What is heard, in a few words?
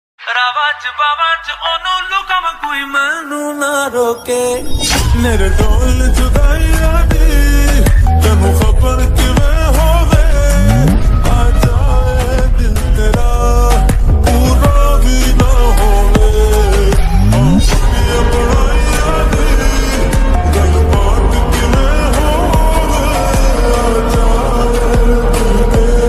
slowed reverb; Coke Studio